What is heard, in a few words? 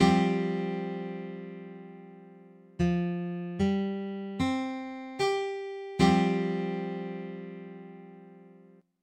Csus4, Guitar Chords